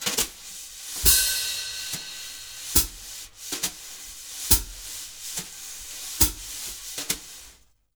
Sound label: BALLAD, Jazz Latin Drumloops